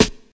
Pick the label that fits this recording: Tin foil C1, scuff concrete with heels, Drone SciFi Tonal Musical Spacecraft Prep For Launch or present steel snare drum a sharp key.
present steel snare drum a sharp key